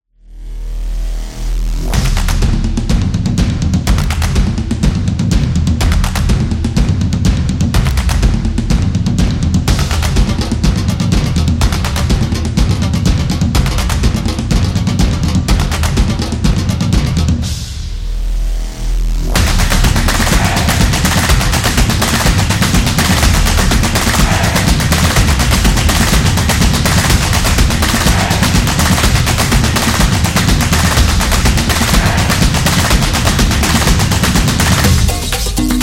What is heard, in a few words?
Sports Trailer, sport drums